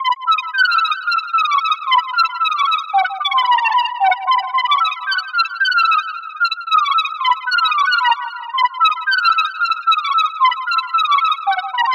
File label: Birds' Song